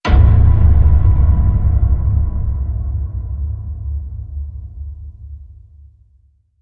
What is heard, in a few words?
Hit Sledge Piano